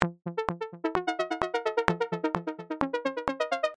Lead; PatchFactory

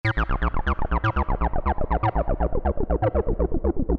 Bass

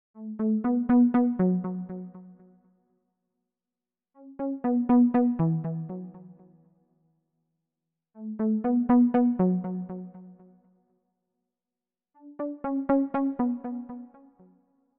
Lead; PatchFactory

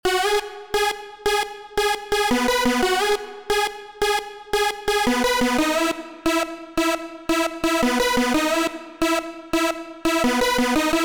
Lead; PatchFactory

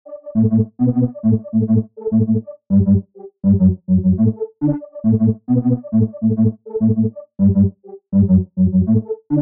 Lead; PatchFactory

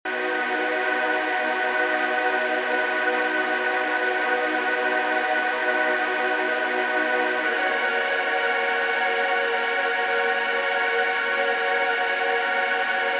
Pad; PatchFactory

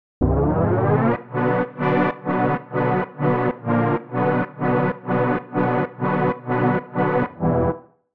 Pad; Square Perception; Nobody Knows

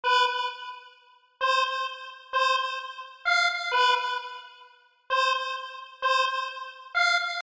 Lead; PatchFactory

